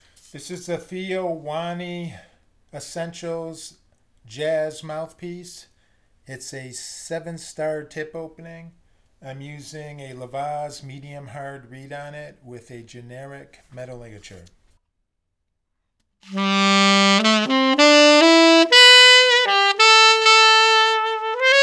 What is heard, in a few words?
Jazz, tenor saxophone mouthpieces